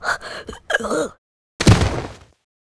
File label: Stone die; Seer; GamigoNA